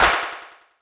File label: clap mid delay, Drum Hits; Classic Chicago House; 90 s Sample CDs